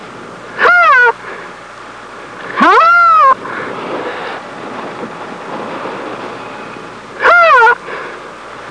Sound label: Rhino calf